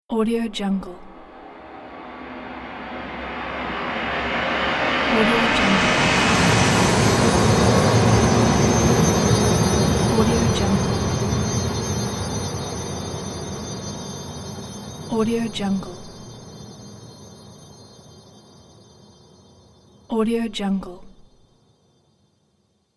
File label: magic transition panned